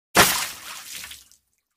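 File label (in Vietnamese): Tiếng đổ xô nước tát gáo nước từ trên cao xuống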